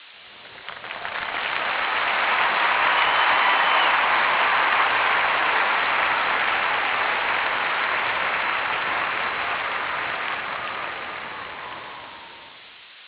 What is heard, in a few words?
cheers